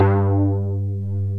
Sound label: BOTL MARIMBA; OMI - Universe of Sounds